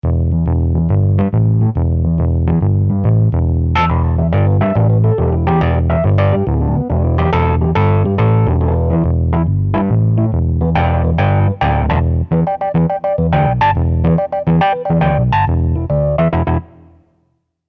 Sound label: amp ep; fantom xa